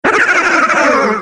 attack; freak fortress 2; luigi creature

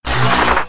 morph; samus powersuit

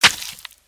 human hit

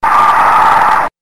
scream; revenant

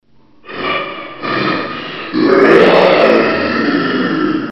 zombie; dod

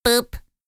boop; tf; a hat in time; hat kid

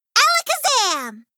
hatkid magic; tf; a hat in time

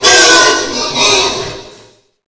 wretch tunnels attack; A Machine for Pigs

